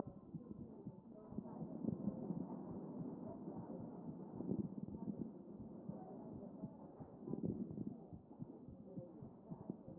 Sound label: CHEST pos1 D MODE